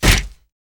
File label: Skin Scale, Damage